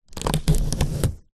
office sticky tape stick to cardboard press slide finger; zapsplat